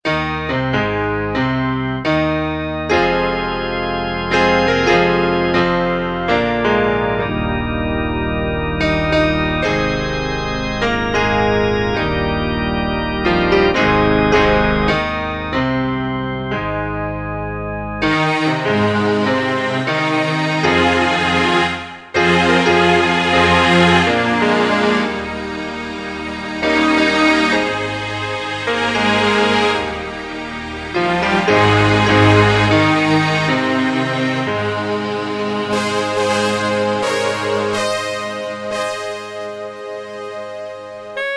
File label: national anthem